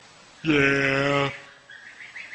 Lamb Say Yea

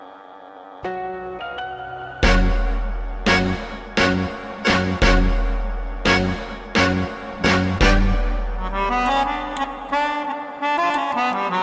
Mirage Instrumental

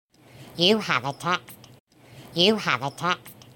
Helium Notification

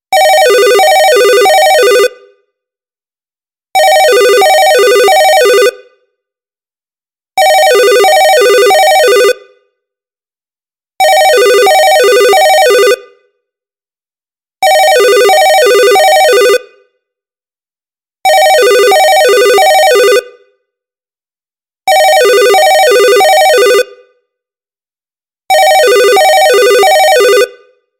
Vintage phone

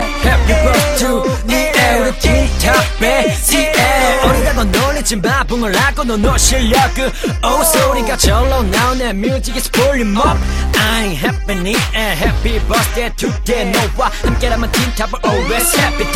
Caps rap

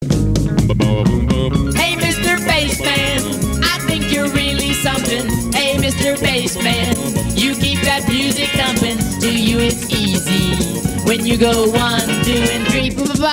bassman; Rockafire

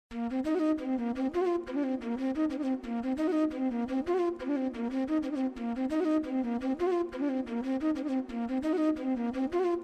Painful Flute

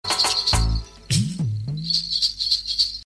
Alert Bells

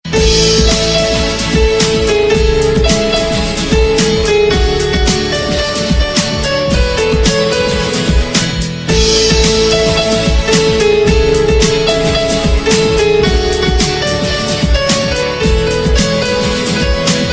Instrument

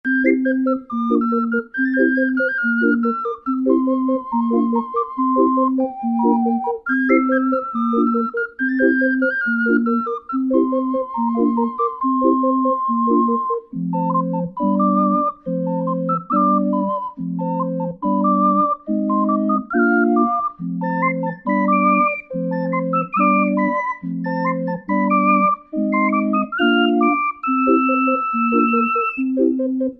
Fairy ringtone

